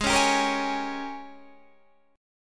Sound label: Ab Major 7th Suspended 2nd Suspended 4th Ab, down, Guitar, Open D